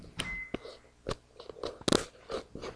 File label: phone